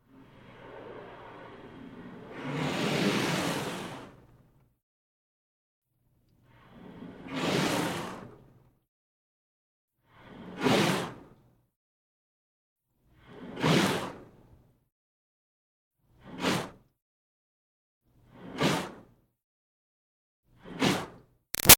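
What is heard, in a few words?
Water Spray Interior Car Hood Up; Car Wash; Spraying; Big Room Sound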